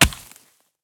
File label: pistol drop grass; STALKER; IWP